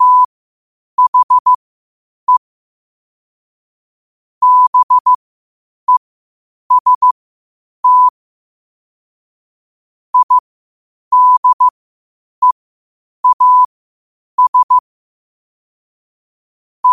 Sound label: 5 wpm, morse